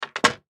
Pool Ball Set Cue, Pool Ball Set Cue On Felt Table; Sports